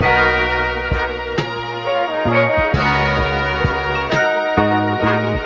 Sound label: horns, Loops